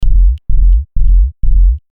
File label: kick